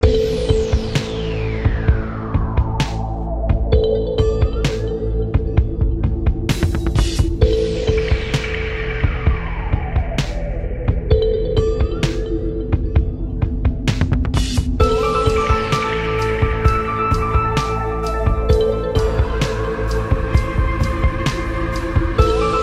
song; moon defense

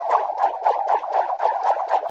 attack; dexomon2

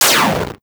8bit destroy; memorize fast

